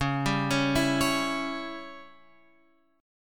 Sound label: C sharp Diminished C sharp; C#dim Chord {9 10 11 9 X 9} arpeggiated